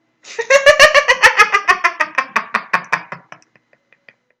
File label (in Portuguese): risada